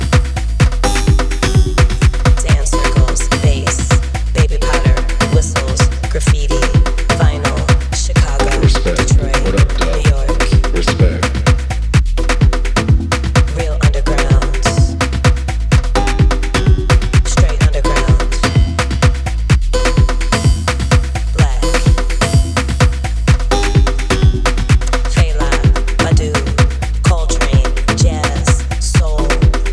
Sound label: Underground Black Vocal Dub